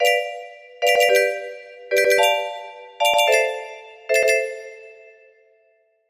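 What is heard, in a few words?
4 chord progression, music box melody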